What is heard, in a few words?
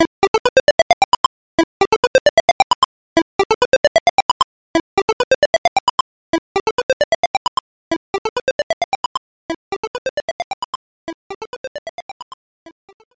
Win; hot pixel; psp